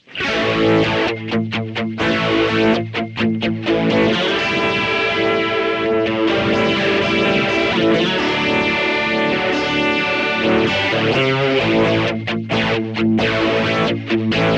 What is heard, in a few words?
Vox AmPlug Phaser